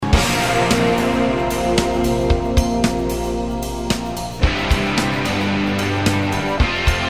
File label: Double Ride